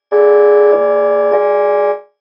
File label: bassoon